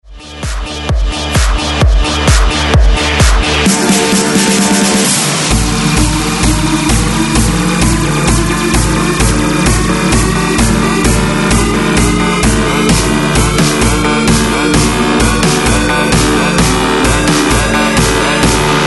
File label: Whats Up Suckaz 130 BREAKMIX